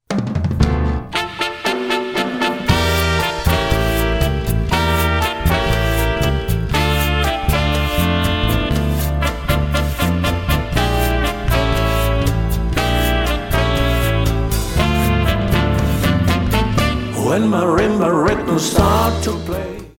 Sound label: Latin Dance